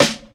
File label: dry snare d key; snare drum samples